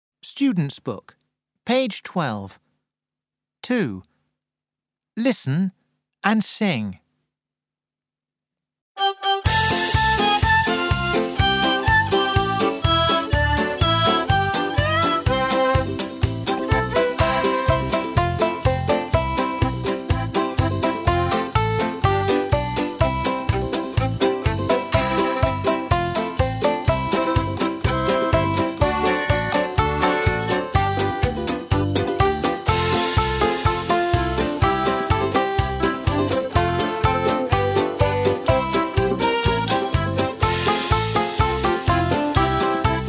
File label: Karaoke; Super Minds Level3